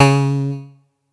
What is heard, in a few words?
atari 520st Cs3